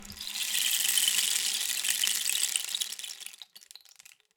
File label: Rain Stick, Percussion Samples